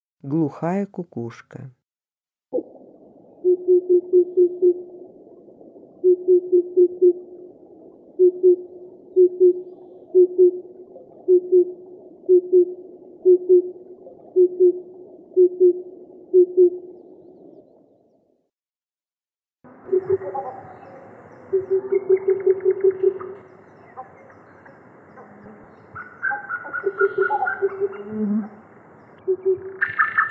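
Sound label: oriental cuckoo voice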